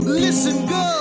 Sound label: Mesage